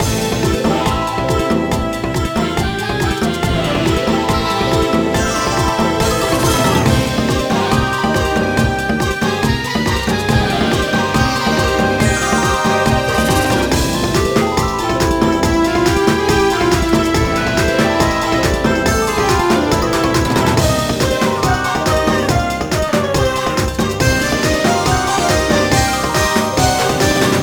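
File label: background music map; ovenbreak 3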